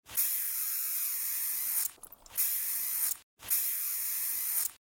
shipenie pojmannoj myshki v peshhere urala

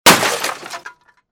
udar so zvonom stekol

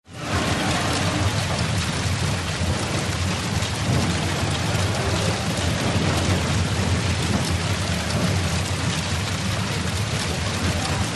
atmosfernyj zvuk posle padenija samoleta

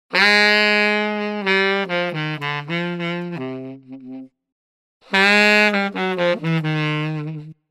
ironichnoe sozhalenie saksofon

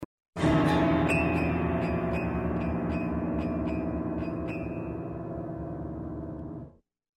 zvuk provala fortepiano struny

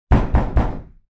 ugroza

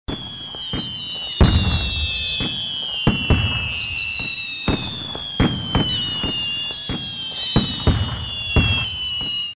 svisty i vzryvy; Звук салюта; Звук фейерверка; звук салюта победы

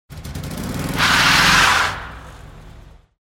kolesa prokruchivajutsja na asfalte bez svista shin

